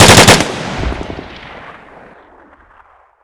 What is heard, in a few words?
mg burst; SuperPower 2 Redux V7A; unit